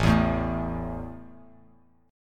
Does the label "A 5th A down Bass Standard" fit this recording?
Yes